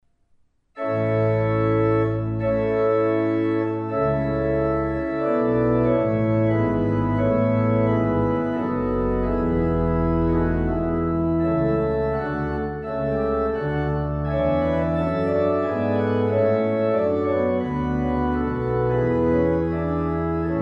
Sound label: rigaudon; campra